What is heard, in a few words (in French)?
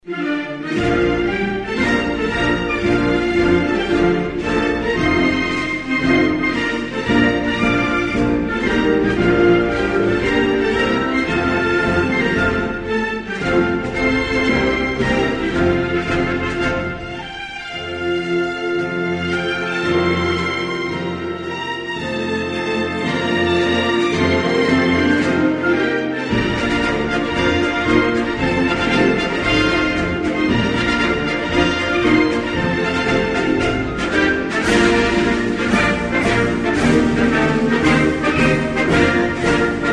Belgique, Hymnes nationaux